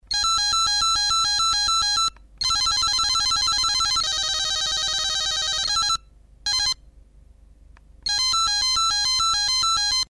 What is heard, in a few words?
phone